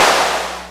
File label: Clapbox Noise; Ableton Foundation FreePacks